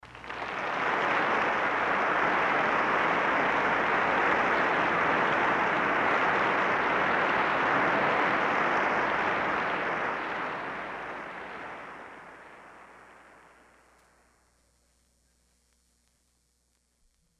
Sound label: Applause